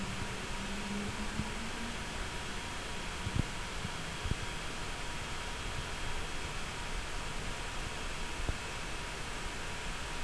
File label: piano